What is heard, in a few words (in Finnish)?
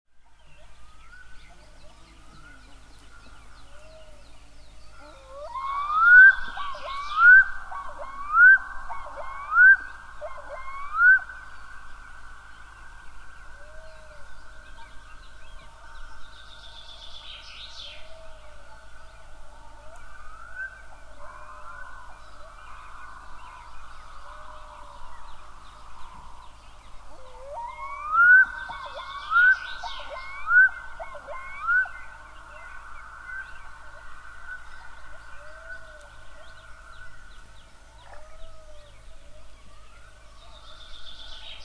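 kuikka, lintuäänet; kuikan ”ku-kuik ku-kuik”-huuto, joka voi kuulua tyynellä järvellä kilometrien päähän; birdlife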